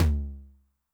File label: LT MOD Noise Low, 909mod LOW TOM; classic machines